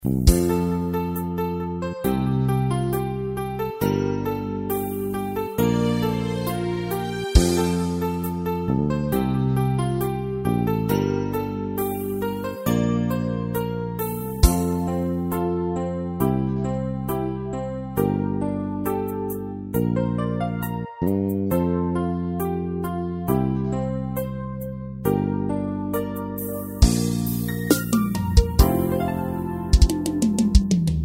play back forro

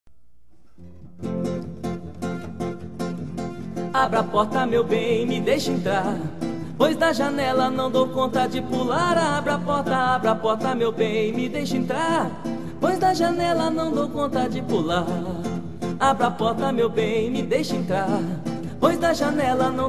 violao voz

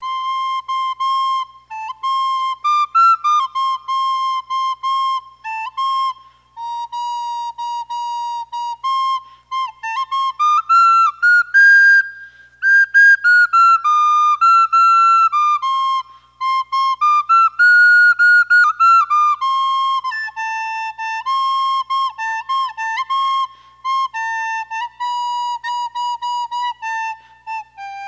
flauta doce

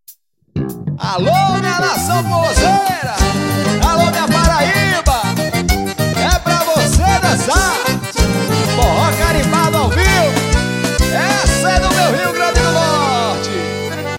abertura

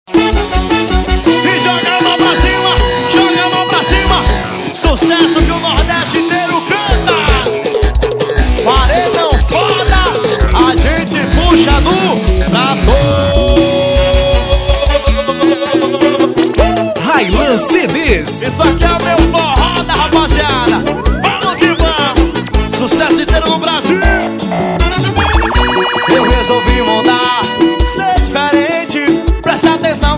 forro paredao do trator

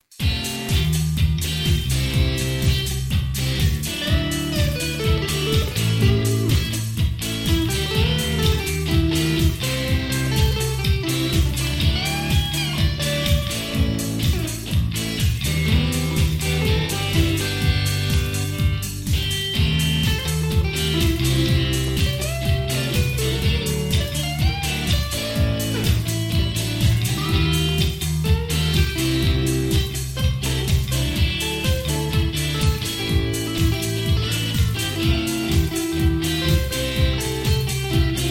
balada

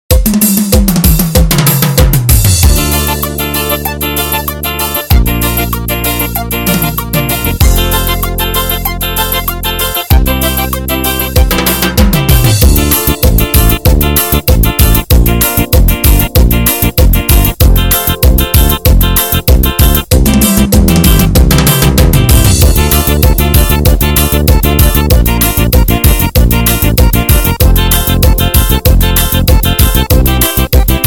forro rasgado, ritmos de teclado

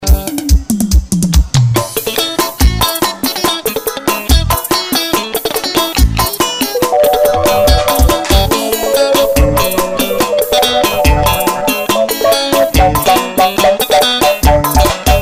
passagem de som

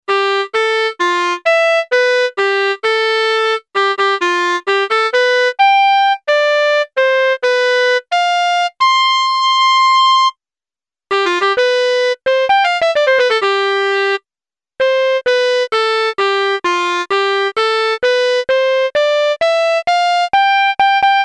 solos progressivos

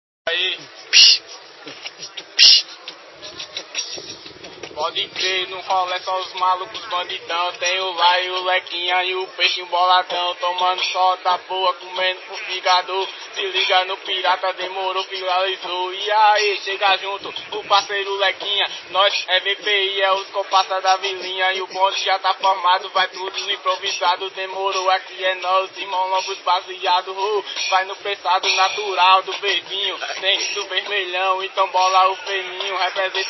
improvisado

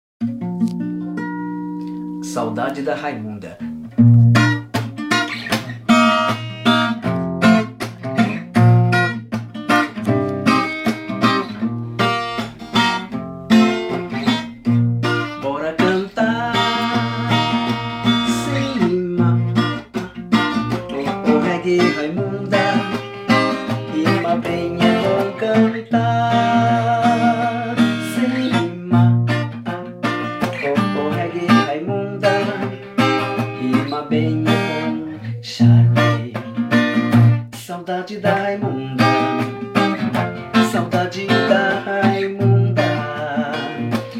reggae

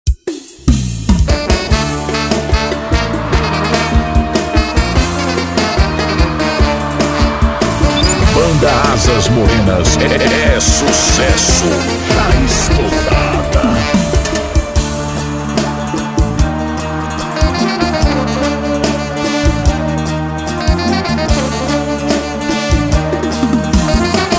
ao vivo abertura

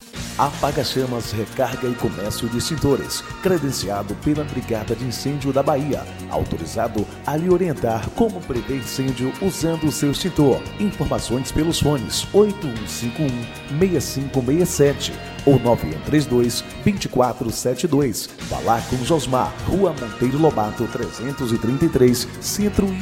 paradinha

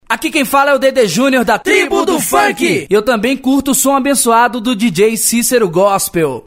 vinheta funk; tribo do funk

